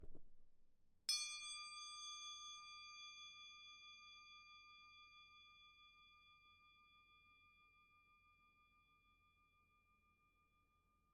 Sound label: Bon Bell, klokke bon shang flat bell